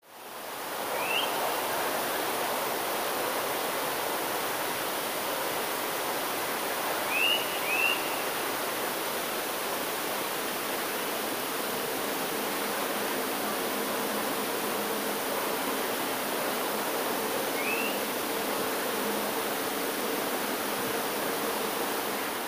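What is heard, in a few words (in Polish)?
kowalik nawoływania; inny rodzaj nawoływań, nagrany 10 grudnia 2017 roku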